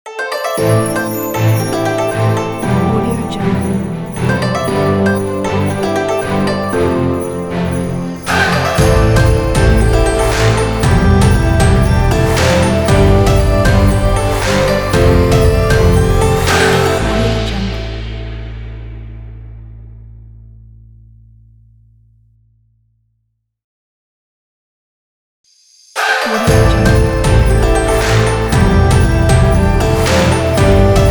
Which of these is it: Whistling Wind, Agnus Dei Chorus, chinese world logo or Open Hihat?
chinese world logo